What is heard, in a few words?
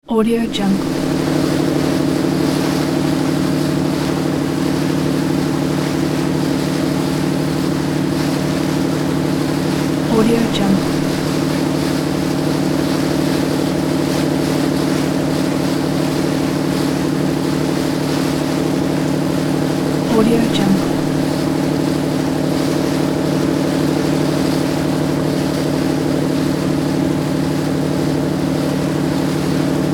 on board diesel boat; sound ideas